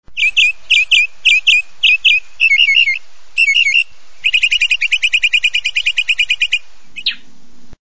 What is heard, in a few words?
mockingbird